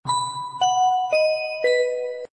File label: closing mall